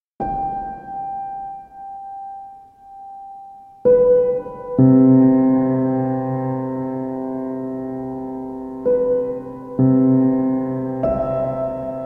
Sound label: Piano Sounds